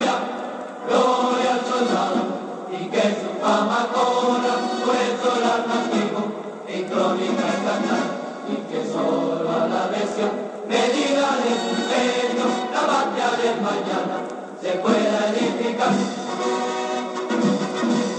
colombian army song ringtone

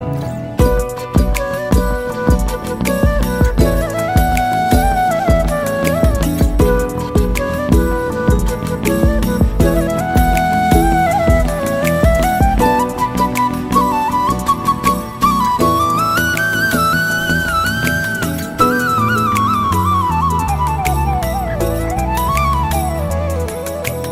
flute ringtone

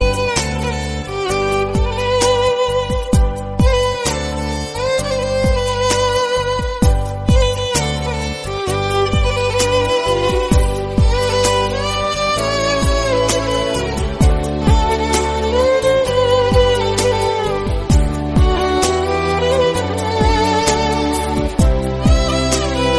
violine male ringtone

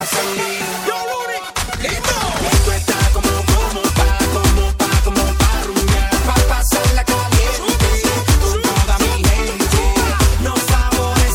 cool mexican ringtone

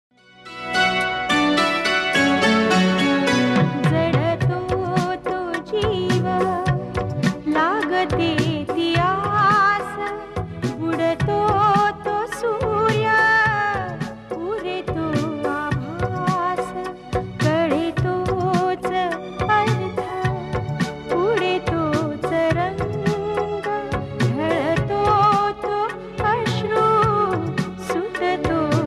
marathi serial title track song ringtone; aabhalmaya

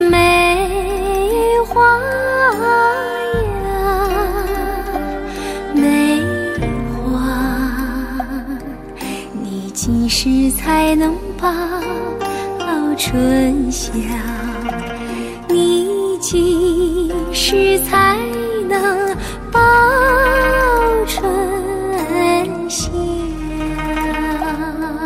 song ringtone

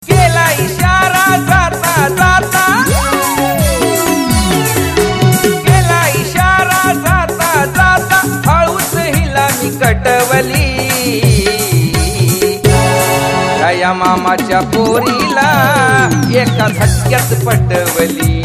marathi ringtone

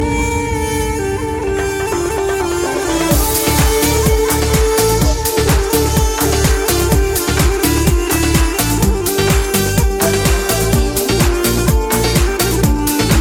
russian classic

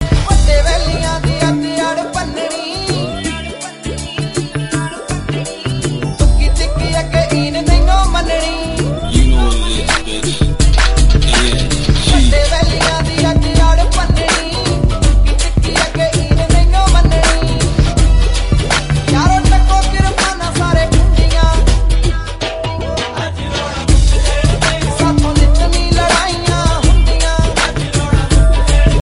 punjabi ringtone

